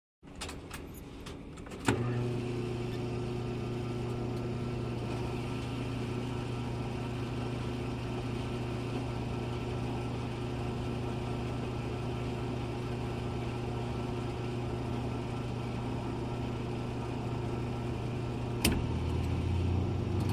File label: Gas Pump